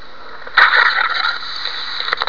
Camel; Camel grumble

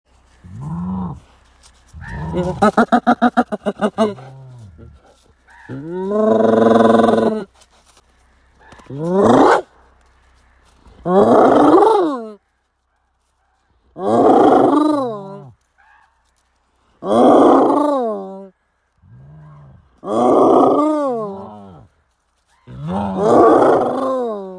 Hyena Threat; Hyena Threat 2

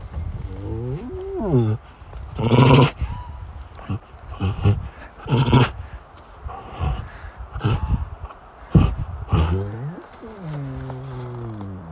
Tiger; Tiger Talk

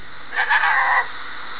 cougar

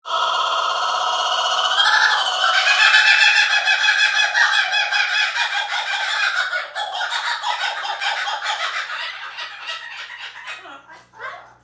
kookaburra

